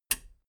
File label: metal button radio release power on